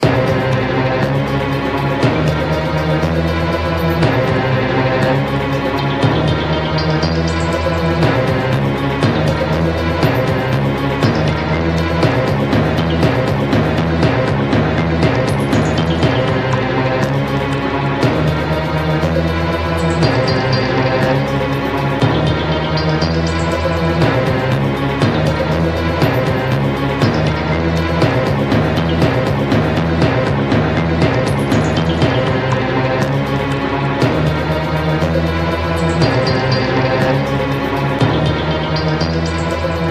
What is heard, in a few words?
Jingle Rpg Map